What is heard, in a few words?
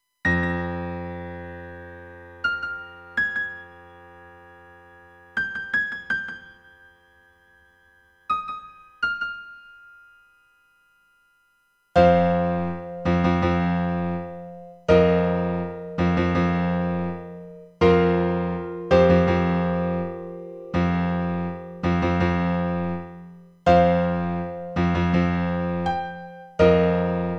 piano